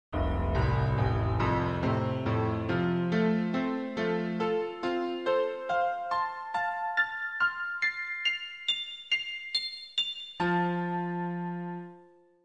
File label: piano